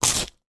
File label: human person bite into cracker